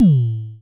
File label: FM Kicks A2, Vermona Kick Lancet; LookIMadeAThing Kicks